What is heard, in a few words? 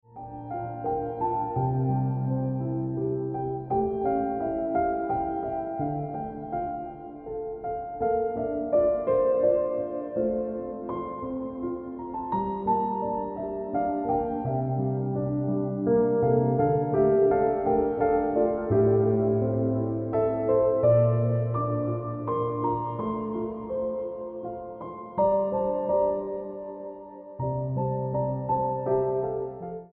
Ringtone Piano Music